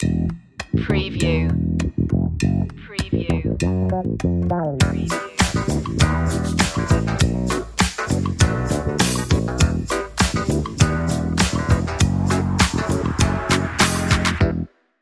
good vibe

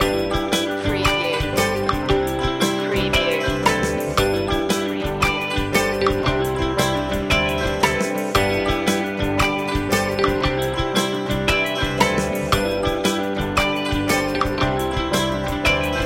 folk loop

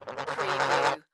Bird Turkey Squawk; sound-effects-bible

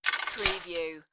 Chain Impact Drop Chain

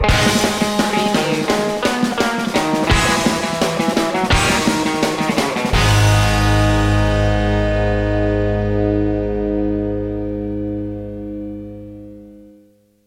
surf stinger

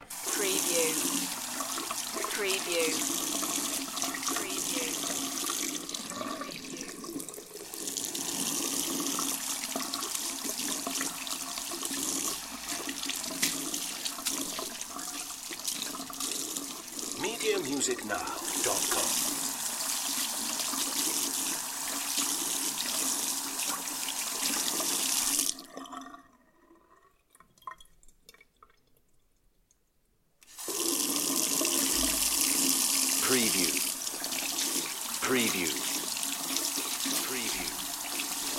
washing hands; Bathroom

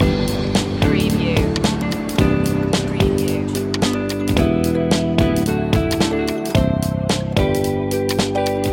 ocean life loop joiner; media music now

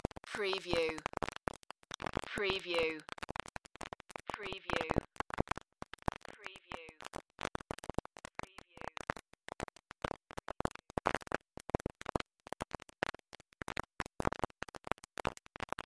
Record Player Crackle

